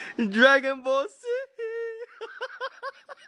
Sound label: heehee